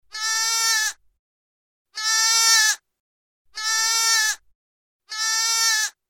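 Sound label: Lamb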